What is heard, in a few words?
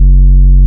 SUBSONIC F#1, BASSES; Best Service Alpha Dance vol.2